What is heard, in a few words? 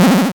Powerup; Phaser starGeek